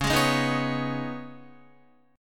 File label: Db 7th Suspended 2nd, Guitar; Guitar-4F,4A,5C,5G,5C,6E; Db7sus2 strummed